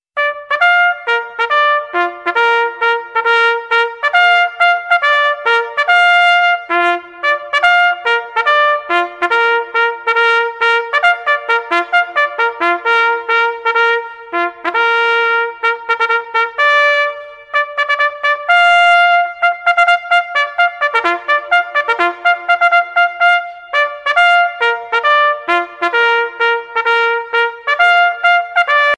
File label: Army Retreat